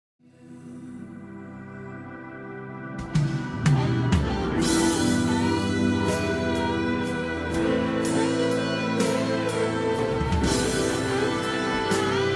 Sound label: Solo Delay Only Mix